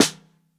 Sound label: SN Bottom, Snare